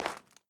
dirt concrete footstep

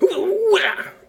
windup; Shinobi windup old

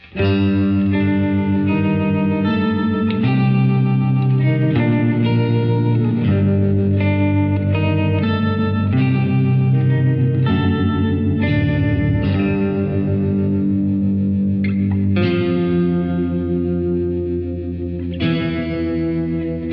Guitar Intro

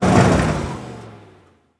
Engine Stop

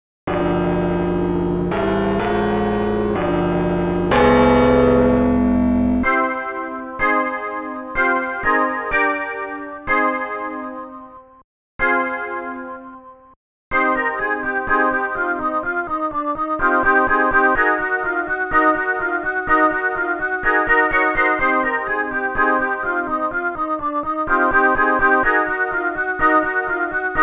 Church Tune; The Town with No Name; Amiga

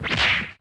Punch

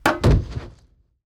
Death Fall; portal quest android

